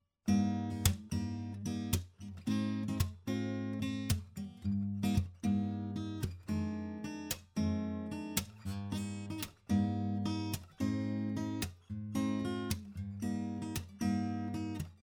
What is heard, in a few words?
114ce C414 Fingerstyle Perc; LTD